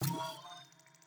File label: Teleport Good Area; SteamVR; InteractionSystem